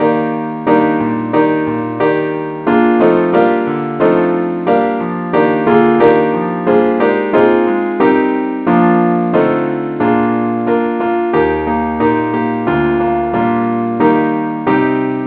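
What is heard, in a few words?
keyboard key E flat; saintthomas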